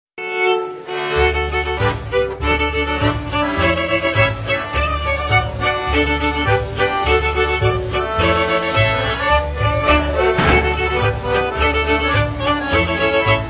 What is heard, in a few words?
polka sekstur